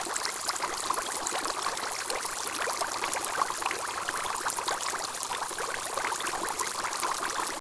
Goldfish

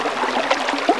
Water, 水声; 特酷声音素材库

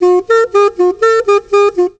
Joy, happymusic; 特酷声音素材库; 背景音乐

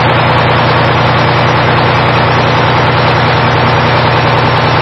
Truck; 暴力赛车

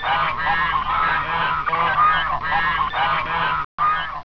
GANDER, 鹅; 动物; 家禽; 特酷声音素材库

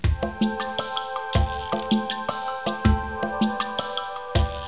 Music; 特酷声音素材库; 片头音乐

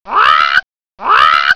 Bird; 特酷声音素材库

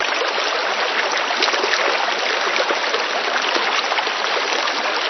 River; 暴力赛车

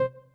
fat n soft button; 18 button and menu sounds

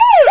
ambulance; 暴力赛车

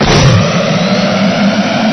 turbo; 暴力赛车

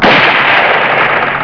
SHOOT, 射击; 特酷声音素材库

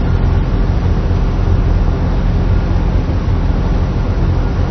V8 Sample; 暴力赛车

